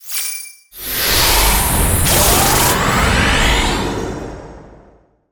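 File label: Pack Upgrade; Yu-Gi-Oh! Master Duel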